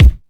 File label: bumpin kick one shot c key, kick single hit tuned to the C note